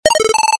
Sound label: Ringer Office Pro; V710 Ringer